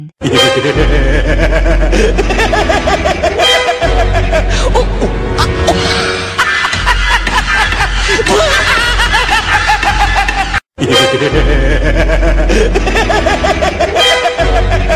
Laugh Ringtone; ringtones

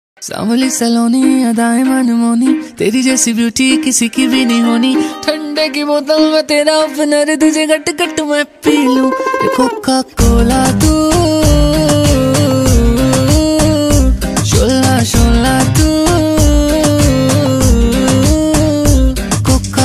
Romantic Song Ringtone

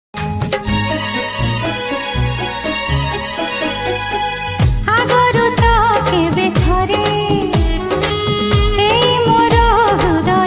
Odia Love Song Ringtone

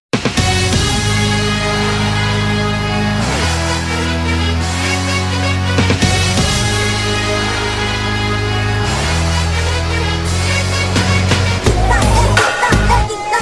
Tamil Music Ringtone

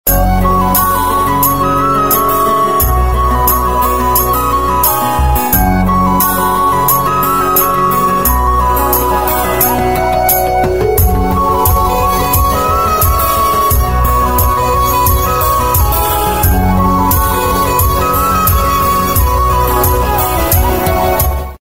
Tamil Ringtones

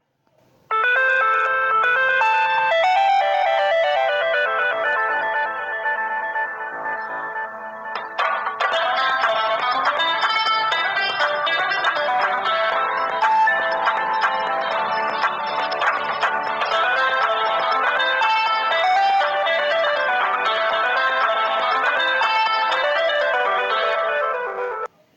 Intex Mobile Ringtone

